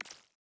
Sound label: ui news popup